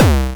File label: LSDJ Kick Square A, Nintendo Gameboy